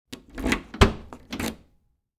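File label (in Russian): звук открывания двери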